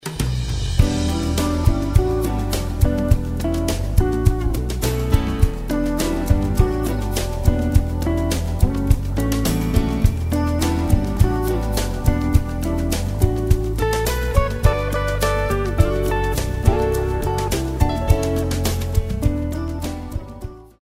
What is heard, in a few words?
Smooth Jazz